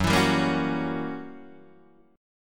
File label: Fsharp 7th Flat 9th down Guitar Open D